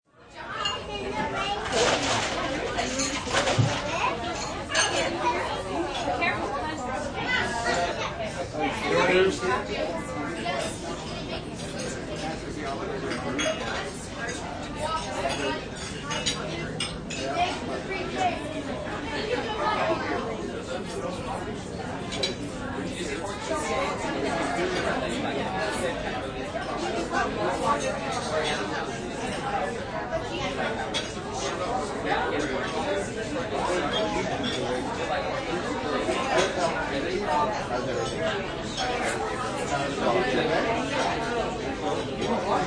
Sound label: Ambience Family, Gathering, live ambience, group of 30-50 family, kids, adults talking; Party Sound Effects; restaurant, dinner party... (stereo), sounds of ice, glasses